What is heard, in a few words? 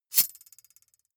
knife slash vibrate